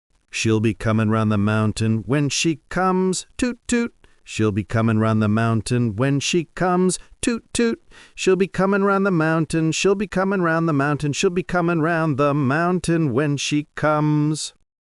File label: male US sing, classic rhymes; Shell Be Comin Round the Mountain